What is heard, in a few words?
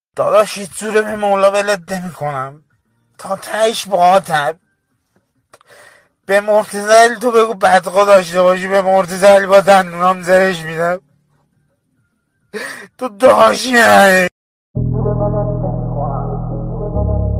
music khahar damad